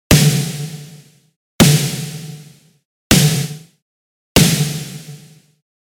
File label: Waves CODEX Fat Snare